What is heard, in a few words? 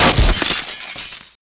turret break